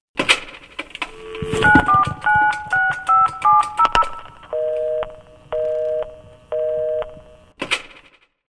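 nabor nomera zanato, dialing number busy, ringtone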